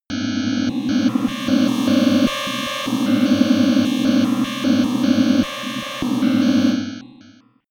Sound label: atmosphere on off